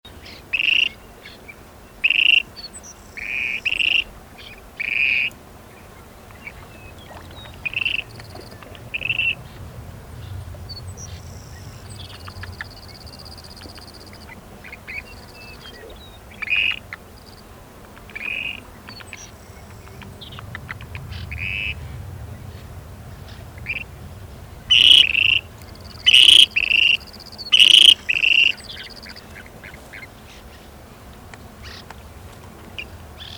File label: Grebe Sound